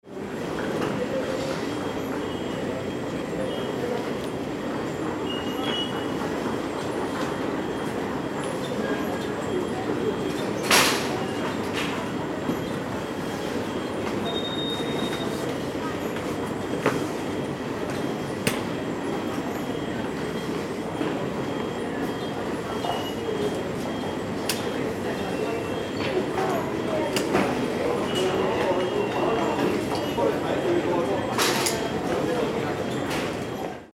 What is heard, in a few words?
airport atmo near baggage claim area